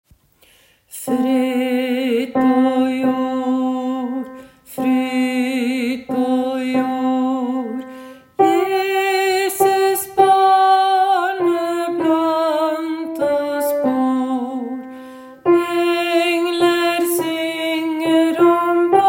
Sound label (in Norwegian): bass; Glade jul vers 3; åfjord